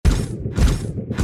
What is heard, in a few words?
Walk; starbites